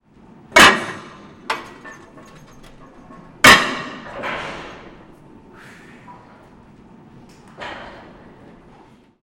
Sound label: Gym apparatus bench press adding weights